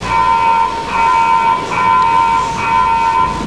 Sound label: weird alarm